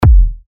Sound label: 87 E, techno kicks; Frost; Revealed Techno Kicks Vol. 10